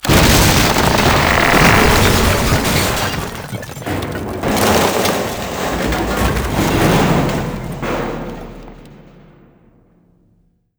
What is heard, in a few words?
sawmill destroy; War Ambience